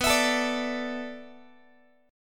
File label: B Minor 11th B down Guitar 4A 4E 5A 5D 5G sharp 6C sharp; strummed